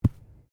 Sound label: Body movement Ground Impact Light; Body movement foley to simulate falls and deaths; Wrestling And Struggling Sound Effects